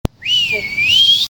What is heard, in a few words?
Whistle